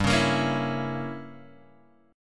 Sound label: G-Diminished 7th-G-down-Guitar-Open F; strummed; JGuitar